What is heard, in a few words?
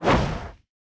flameburst tower shot